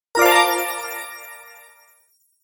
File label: Correct answer game show tv; film and tv; gfxsounds